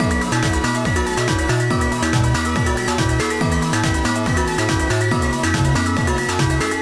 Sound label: GAMELAN 110